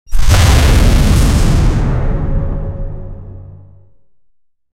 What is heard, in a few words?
epic anime mmorpg giant, energy sweep